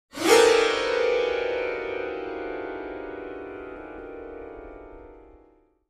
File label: Grn Piano Strings Gli, piano